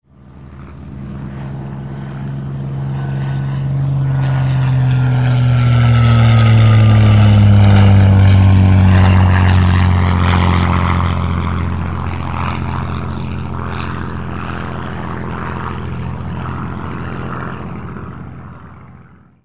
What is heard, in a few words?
spit passage, Aircraft sound record